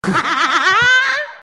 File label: hahaha